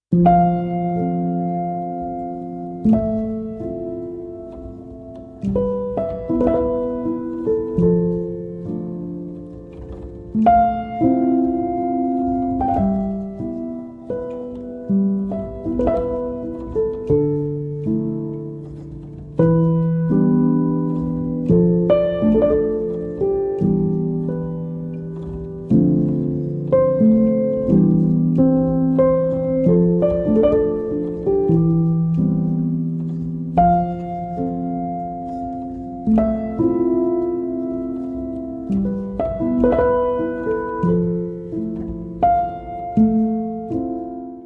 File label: Melody